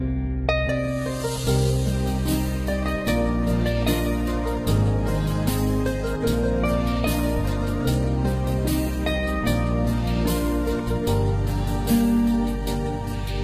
Desert Melody

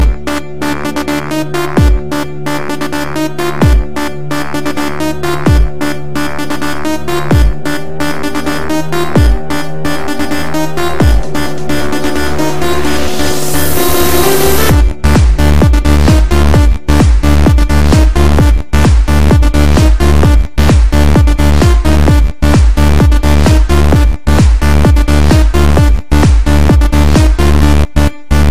Tecktonik Music

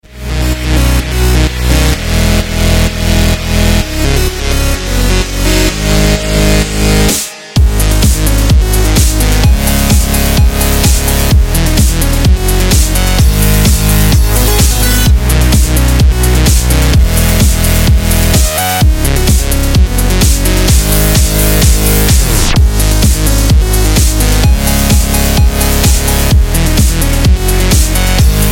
Dubstep Ringtone

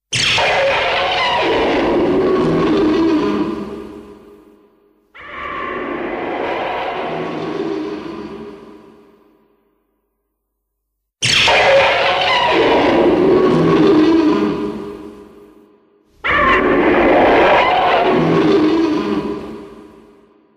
Roar; Rodan

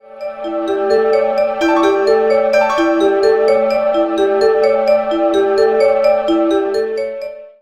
Chill Out Cool Sms

